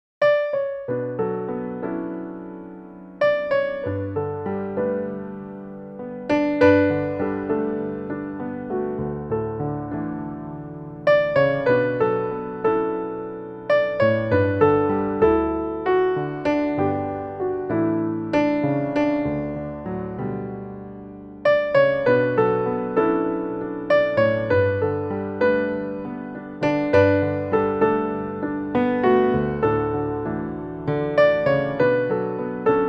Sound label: jazz piano